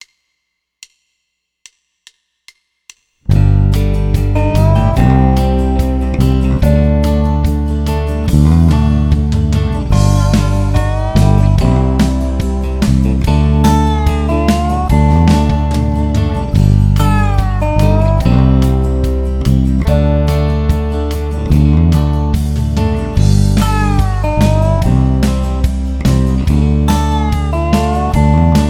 Country Road Slide Full Band; 7-Day-Bass-Challenge

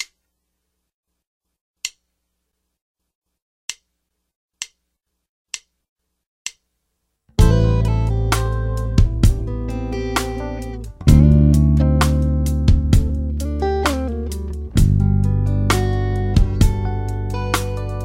Whole Notes Song Playthrough 65BPM; Bass Road; Bass Guitar 101

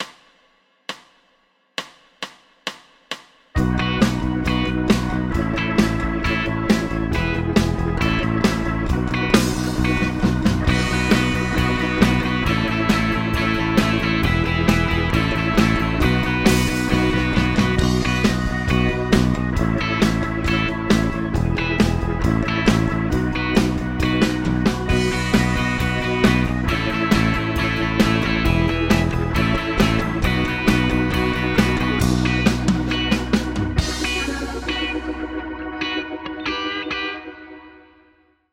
Straight Eights Rock With Pick 135BPM, Bass; Bass Road

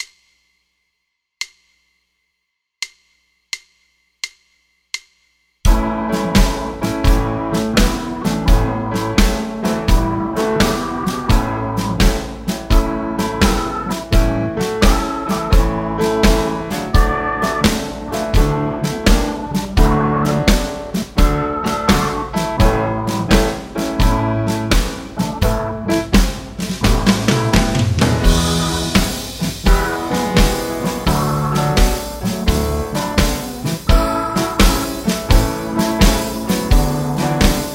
Bass House Blues Backing Track 85BPM; Shuffle Rhythm; 7-Day-Bass-Challenge

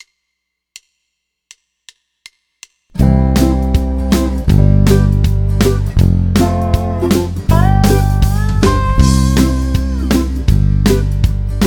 Full Band; Root Notes; 7-Day-Bass-Challenge; The Tree Line

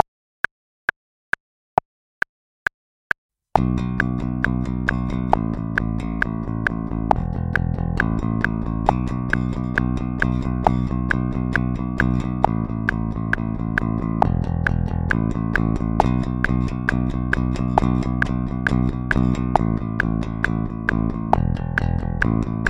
Straight Eights Rock With Pick Bass Only 135BPM; Bass Road

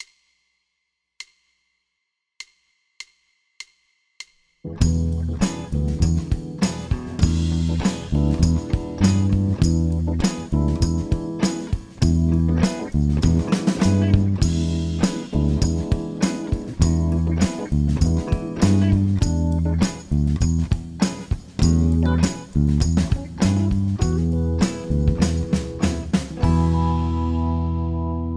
Easy Funk Bass Groove; Bass Road

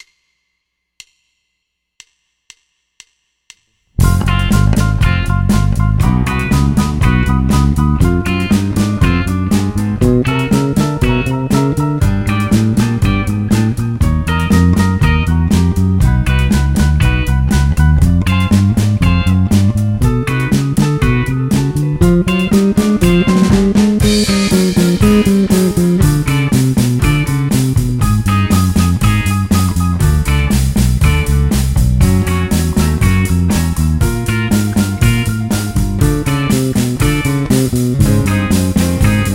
Full Band; 7-Day-Bass-Challenge; The Roller Coaster

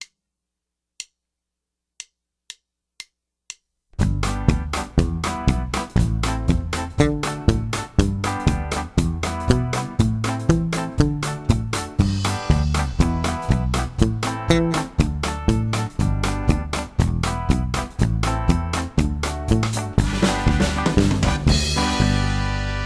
Ska Bass; Beginner Ska Bass Lines Writing Formula

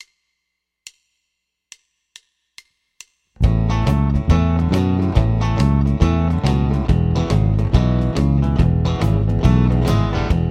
Country Bass Playthrough 140BPM; Bass Road; Bass Guitar 101

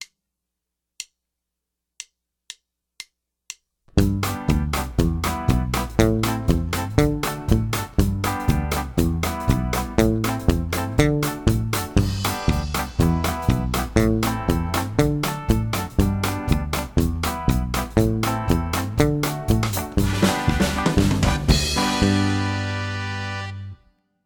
Ska Bass; Beginner Ska Bass Lines Writing Formula

